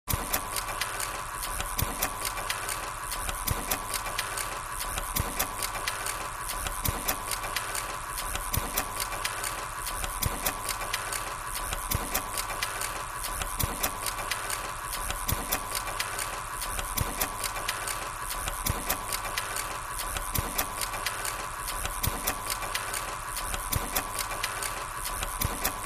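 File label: Mech Fantasy Machine, buzz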